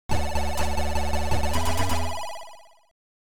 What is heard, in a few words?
Title AY; Hawk Storm